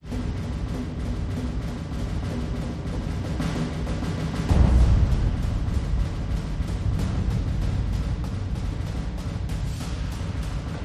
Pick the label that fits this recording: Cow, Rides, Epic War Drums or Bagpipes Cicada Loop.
Epic War Drums